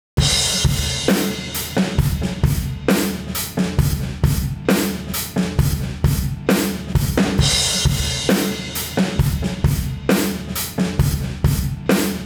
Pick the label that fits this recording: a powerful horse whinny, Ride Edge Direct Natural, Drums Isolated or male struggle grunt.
Drums Isolated